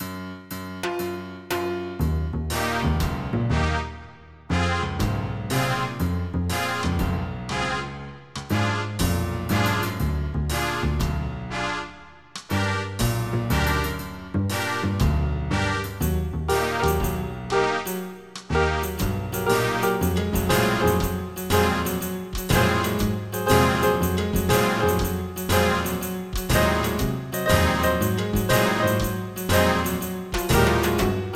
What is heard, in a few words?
Reggae; Pacman Top Games Collection; Loop MIDI Music